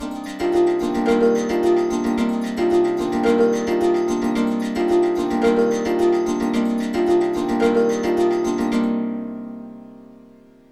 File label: Trance SYNTH SEQ, 110; AMG; Global Trance Mission VOL-2